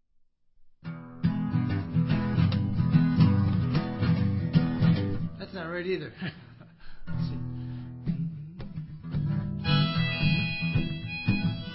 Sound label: Bamboozled Acoustic